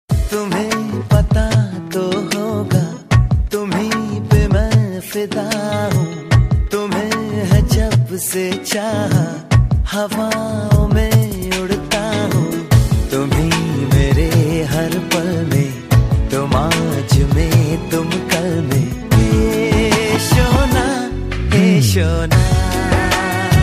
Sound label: male